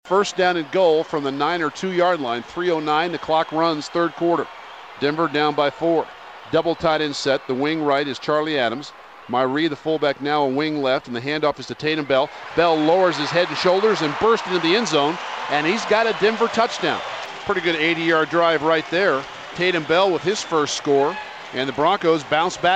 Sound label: bell yard; broncos